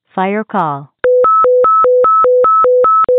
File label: fire call chirp